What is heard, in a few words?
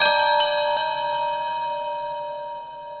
echo, Drum N Bass; Sonic Foundry (Sony Creative Software); Knutrix Drum & Bass